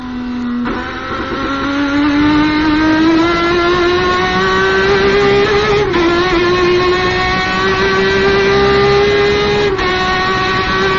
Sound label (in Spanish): motor turismo ringtone